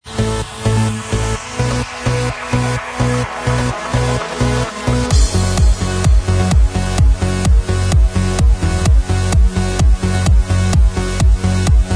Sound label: remix Inst Track